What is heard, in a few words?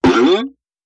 Boing Up; boings